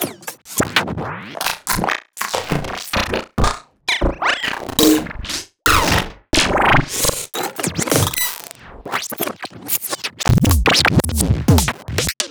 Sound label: Glitch; ghosthack